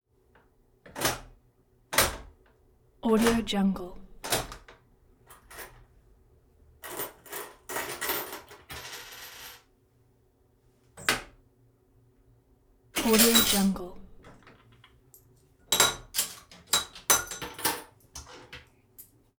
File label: pay phone dropping quarters into coin box